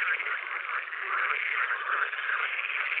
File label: Pool-Frog, frogs